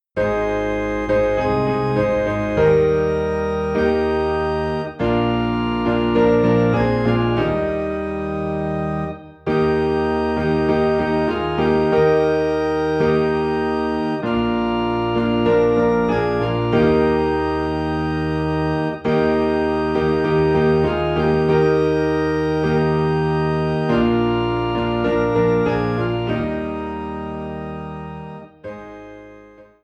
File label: Piano and Organ in E Flat; All for Jesus